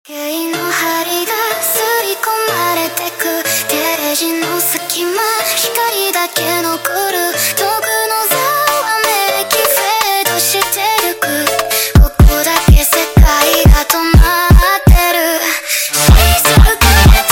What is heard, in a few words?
Montagem sped Up